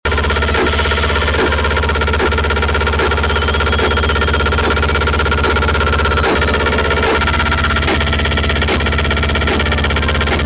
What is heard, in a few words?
open noise; kunstvlaai 2006